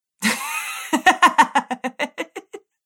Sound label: Woman Laughter